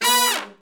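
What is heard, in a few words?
short fall, brass; Roland L-CDX-03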